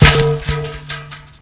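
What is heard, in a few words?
grass; Mafie 1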